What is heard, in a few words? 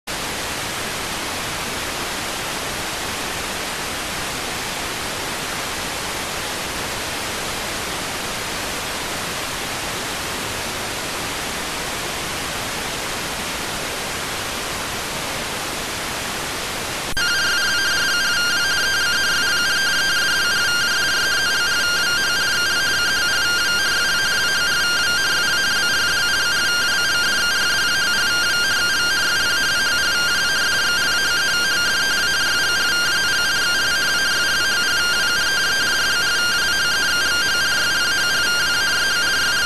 rtty rx